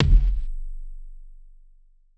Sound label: C1 Tuned Kick; One Shots; Kicks; Touch Loops; ElementsOne; Abstract Rhythms